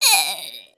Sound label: Die